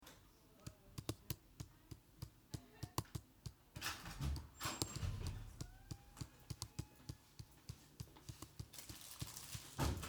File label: Tapping